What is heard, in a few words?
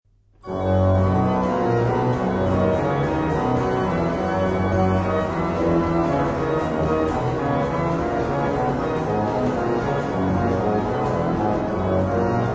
toccata